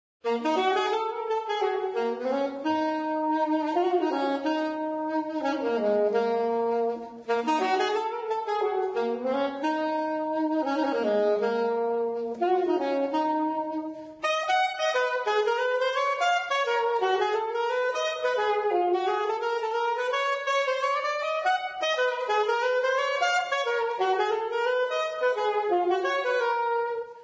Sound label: Saxophone; Zomboid; Lifestyle